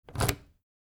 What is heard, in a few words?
key door remove; transportation